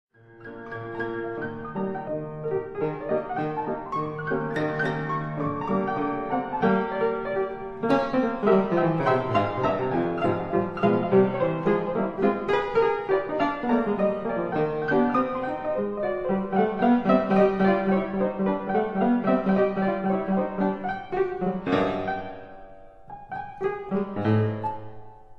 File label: Etudes for piano, E flat major